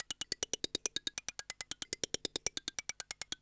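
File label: HIHAT ELECTRO 140, Techno Drum; Techno2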